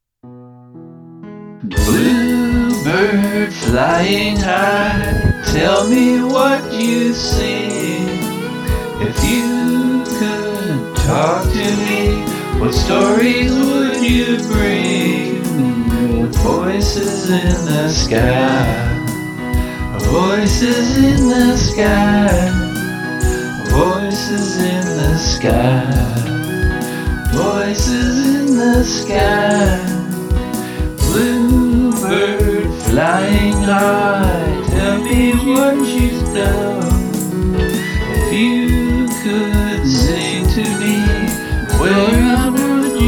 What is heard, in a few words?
voices in the sky sing